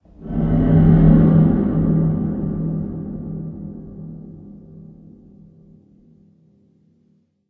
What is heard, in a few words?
Metal Distant Groan